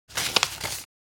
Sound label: Folding paper short